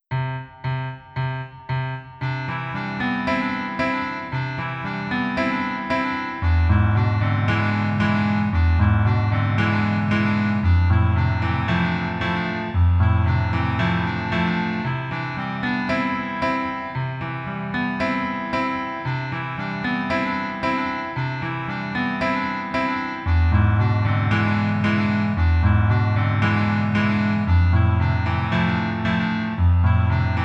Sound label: Piano